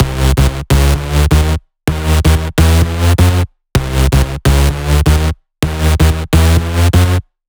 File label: 128BPM Melody; Vengeance Samples; VFH1 128BPM Southern Kit